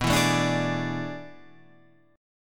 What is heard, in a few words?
A Major 7th Suspended 2nd Suspended 4th